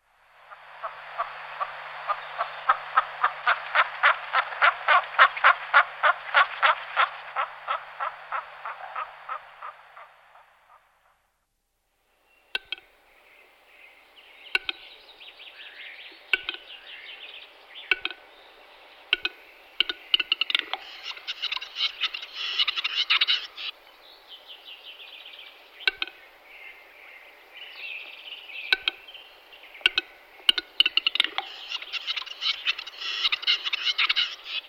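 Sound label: gluhar golos, capercaillie voice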